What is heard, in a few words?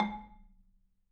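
G#, Marimba dead note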